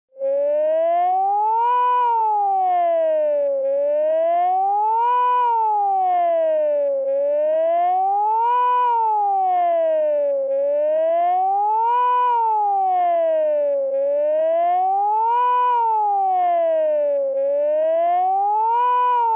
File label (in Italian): allarme alluvione